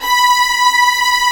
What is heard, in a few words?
STR SEC B4, STRING SEC